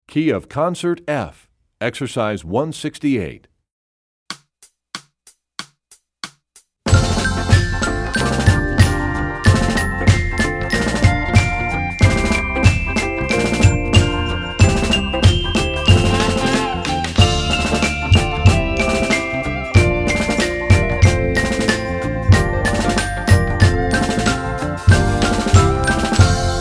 Studies Concert F, Percussion; Rubank; EE2000 Book2

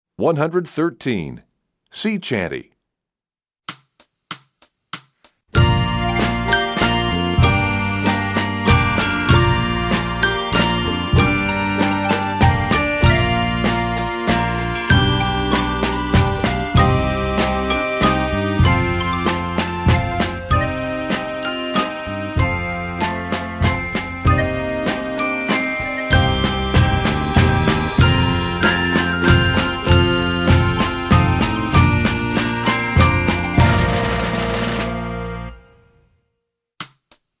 Sea Chanty, Percussion; EE2000 Book1

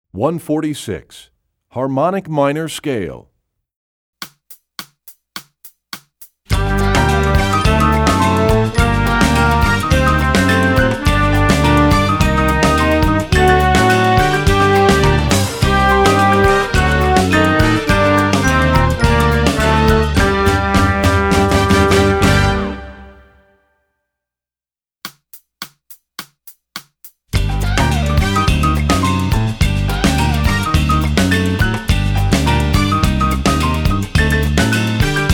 Harmonic Minor Scale, Brass WW; EE2000 Book2

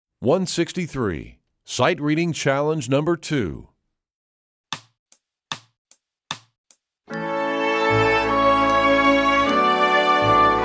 Sight Reading Challenge, Strings; EE2000 Book2

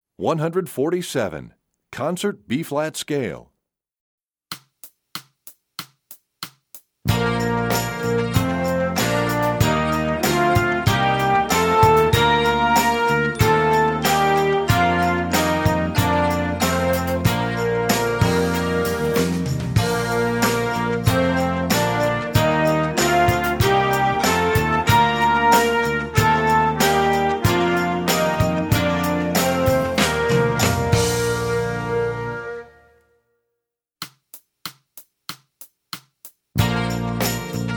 Concert Bb, Brass WW; EE2000 Book1

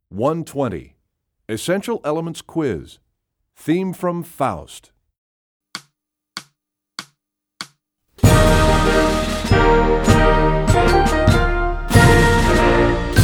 Percussion; EE Quiz; EE2000 Book2

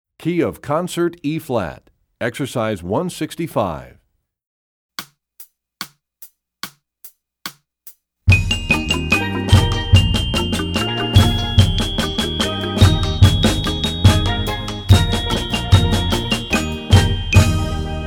Studies Concert Eb, Percussion; Rubank; EE2000 Book2

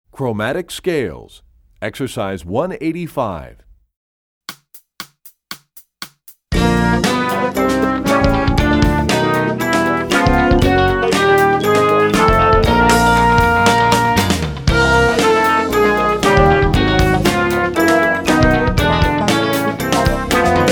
Chromatic Scale, Brass WW; EE2000 Book2; Rubank

